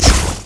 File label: MYSTERY POWDER hit